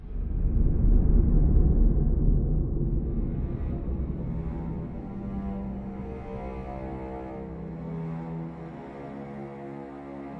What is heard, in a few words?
Cave Theme; bless